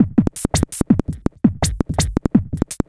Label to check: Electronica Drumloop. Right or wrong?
Right